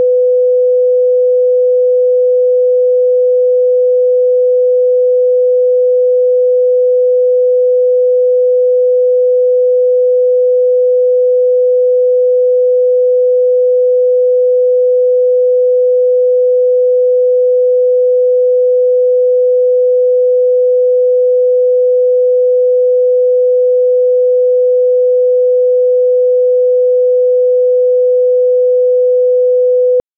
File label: autotest 500hz